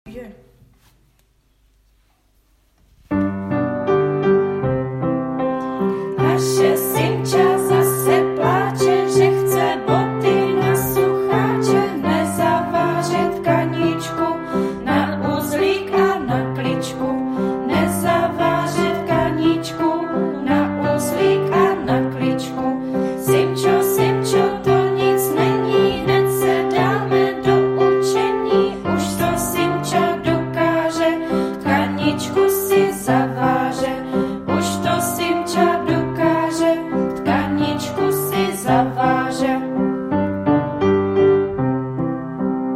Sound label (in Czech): tkanicky zpev; Puntika a Pepiny; logochvilky; logopisnicky